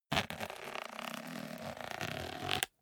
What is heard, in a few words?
zipper small case close slow